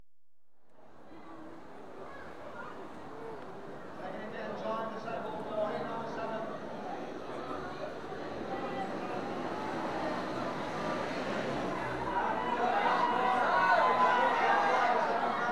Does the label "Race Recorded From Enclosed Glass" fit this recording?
Yes